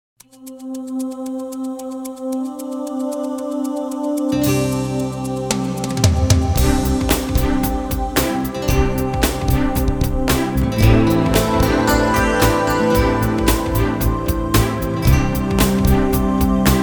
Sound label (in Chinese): 大气的背景音乐